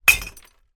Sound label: impact glass bottle onto broken glass in trash